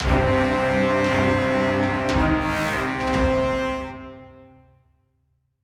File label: Stinger; Boomboss Thogrun